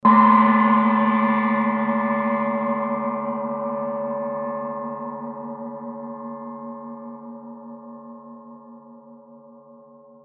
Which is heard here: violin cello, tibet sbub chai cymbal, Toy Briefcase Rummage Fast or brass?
tibet sbub chai cymbal